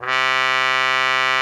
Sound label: cup C3, cup mute; Xsample VOL-3